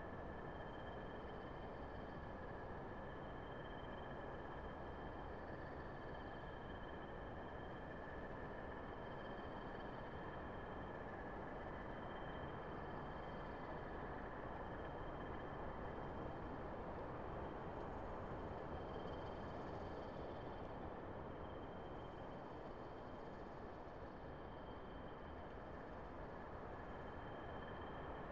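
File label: ambience interior small room; colony; Titanfall 2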